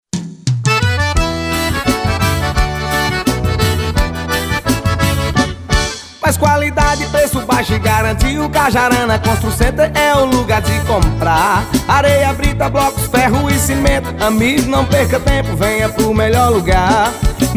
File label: JINGLE; CAJARANA CONSTRUCENTER